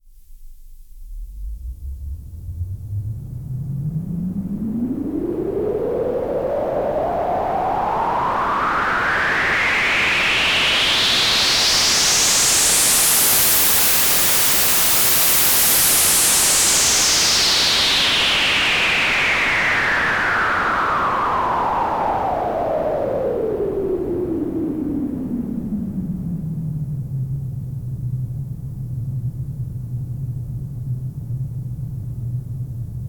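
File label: noise low-pass filter stereo resonance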